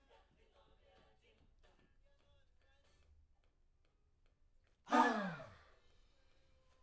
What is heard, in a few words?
Backs SCHOEPS